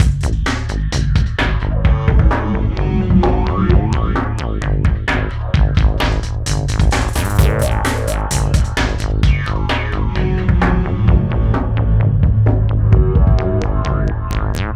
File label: Hardcore Breakbeat, 130 Abmin; Big Fish Audio; Hardcore Breakbeat Action; Mirage